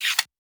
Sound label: gadget small metal slides and latches